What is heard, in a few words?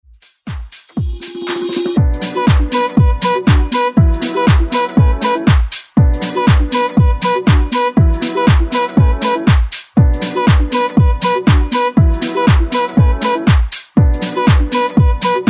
Dance Ext Redrum